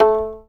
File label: fiddle pizz A3 L; Sampleheads; Whole Lotta Country 1 & 2